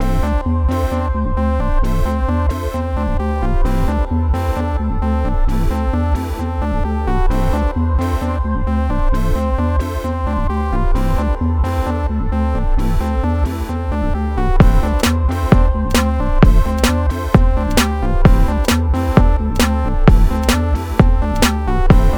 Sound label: Emotional Synth Rap Beat